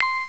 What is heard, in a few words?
ting